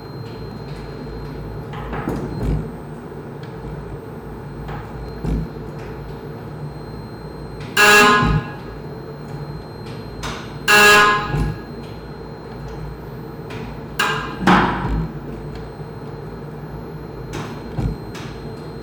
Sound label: buzzer